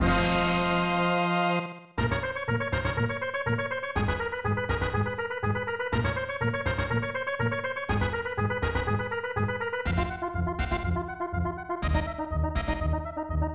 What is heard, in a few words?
Dungeon; record of lodoss war 1995 snes